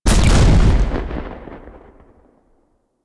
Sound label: Rocket Launcher Explosion; Warframe; UT Weapons